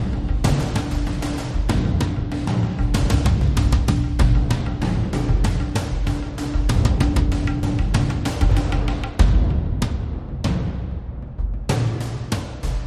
Suspenseful War Drums

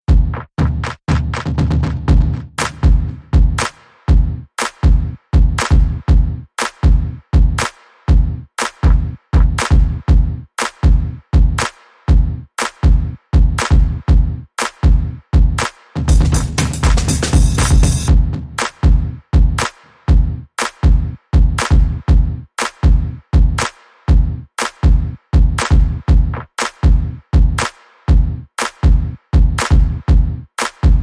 IOU Beat

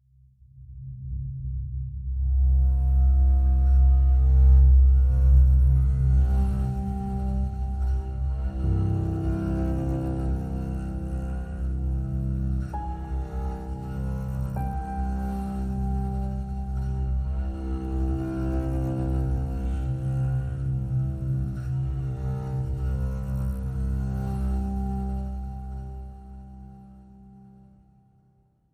Texture

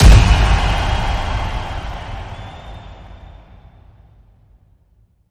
Winning Crowd Cheer Hit

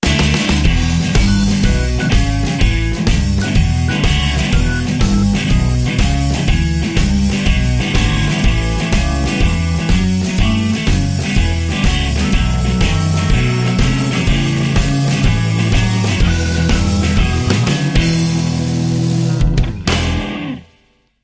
Blues

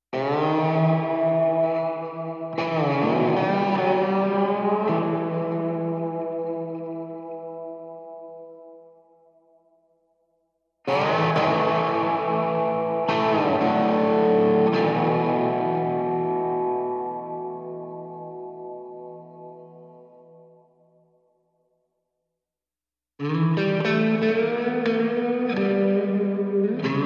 Eminor guitar only

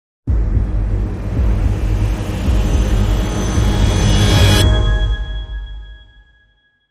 Dramatic Sting

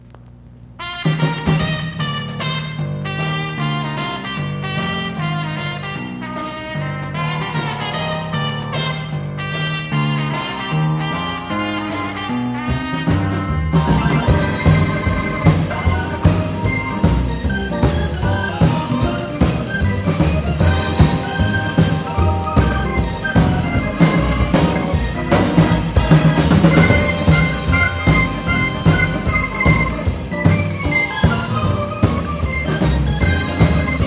Instrumental

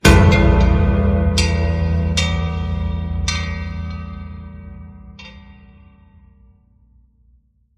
Bells Ringing

